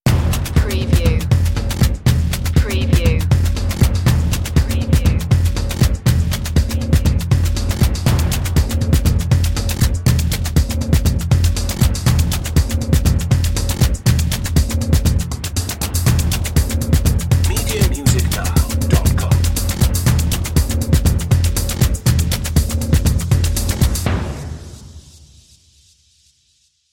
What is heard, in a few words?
voodoo dance